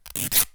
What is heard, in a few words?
Rip, cartoon Foley Fx; musicradar; cartoon caper